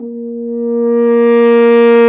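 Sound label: brass, F.Horn Swell; Roland L-CD702; F.Horn FX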